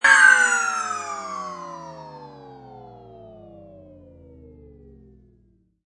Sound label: Cartoon Long Power Down, Space ship landing or machine stop; Comedy/Cartoon LONG POWER DOWN: Space ship landing or machine stopping, turn off; Funny Sounds; Lynne Music